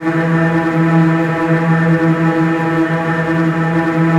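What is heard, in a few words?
STR TREMOLO, Virtuoso Strings, E2, right; ILIO; Kirk Hunter Virtuoso Strings VOL-3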